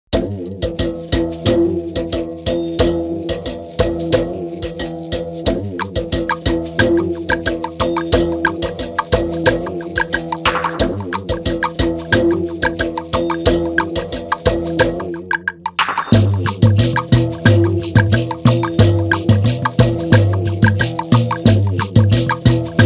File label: PERC